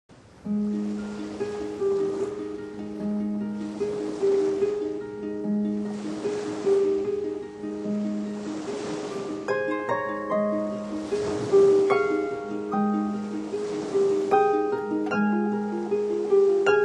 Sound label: Harp